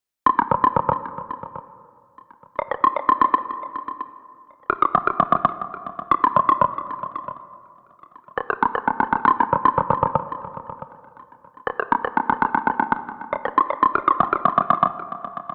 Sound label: Talk, Eerie alien chattering plant calls.; Aliens; Triffid Talk